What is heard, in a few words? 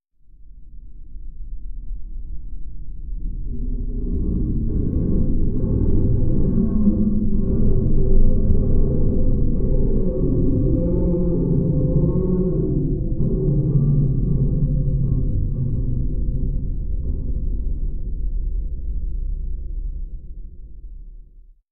Underwater Int Low Me, metal groan atmospheres; Underwater Int Low Metal Stress Ver 2